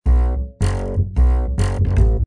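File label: Bow Fiddle, Rockabilly; cool edit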